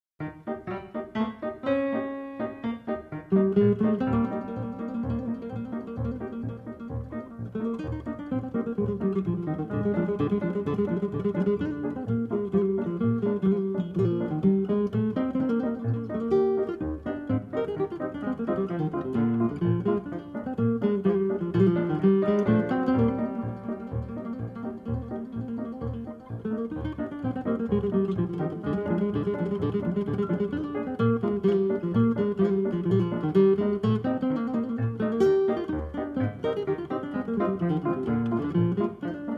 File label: Hora Staccato